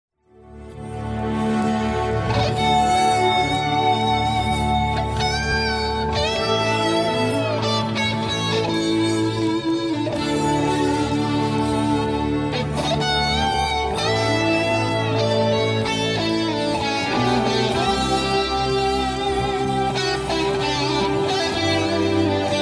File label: Solo